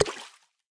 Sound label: Fish Drop